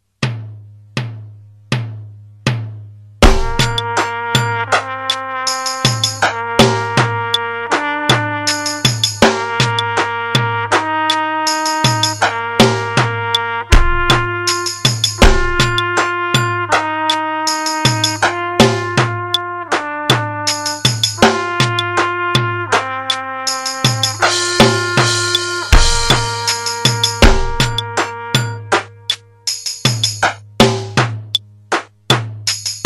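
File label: medium metronome; aerobics book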